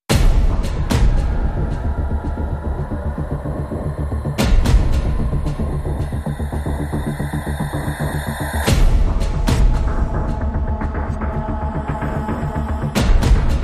Instrumental No Vocals No Drumkit No Guitar

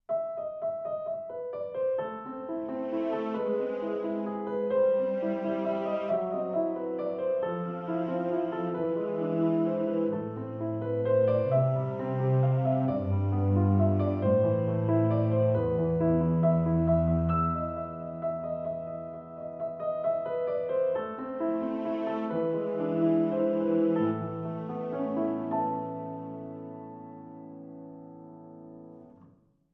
With Strings

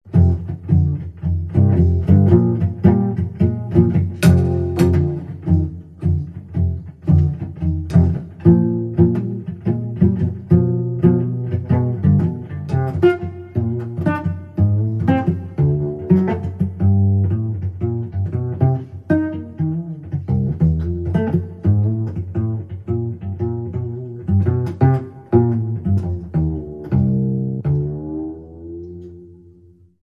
Pizzicato Blues

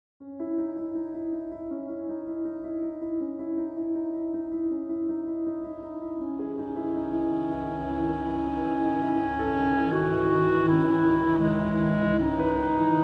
Melody With Clarinet & Violin; Short A

